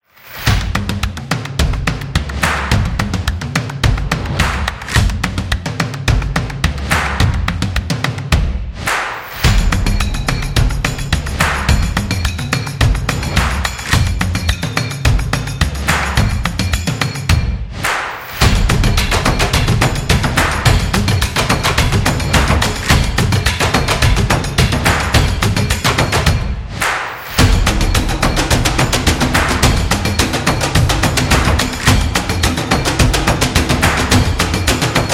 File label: Action Drums